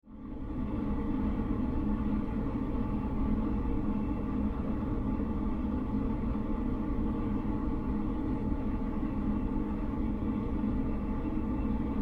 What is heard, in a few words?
Industry Fan Blower Run, factory; SFX Bible